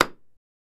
Gavel Sngl Hit

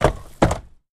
Carriage Footplates

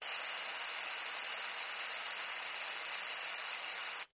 Long Aerosol Spray; aerosol can

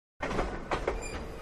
Sngl Clack As Train, track click

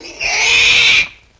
Pig Squeal Wail

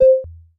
interface button, clicks

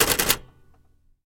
Typewriter Back Spa; typewriters

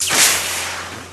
Future Gun Shot Sing, futuristic; Future Gun Shot Single

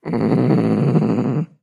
Cat Domestic Growl

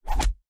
FLESH CHOP, gore

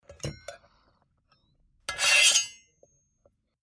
Spatula Long Scrape, kitchens

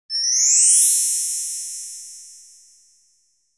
Magical Gliss Ascend, bells

